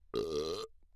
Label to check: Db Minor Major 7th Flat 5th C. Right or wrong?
Wrong